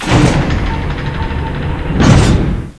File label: airlock door